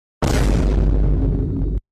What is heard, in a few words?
thud